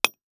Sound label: Weapon Axe Hit Stone; AdobeCC